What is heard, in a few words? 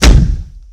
Heavy Kick